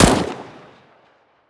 famas, weapons; Counter Strike Model Pack; CS:GO

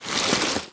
close, zombie blind, foley; creatures

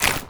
zombie move short, foley; Half Life Model Pack

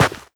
scuff, footsteps, player, carpet

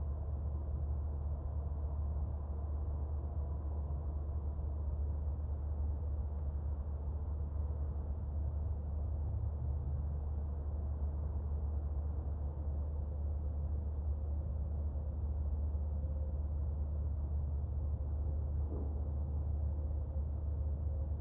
city ext, ambient

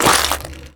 fs fm glass, footsteps; player; Portal 2

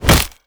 headbutt, zombie impacts; creatures

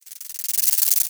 rattle warning layer, headcrab

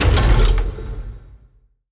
move stop, pallet winch

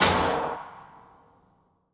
bounce med, ladder

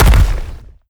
stomp, antlion step

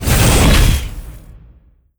move approach walk, dog; npc; scripted

